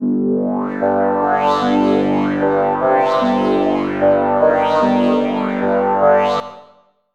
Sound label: Space Sitar, 75